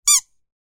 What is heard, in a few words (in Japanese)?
おもちゃのボールの音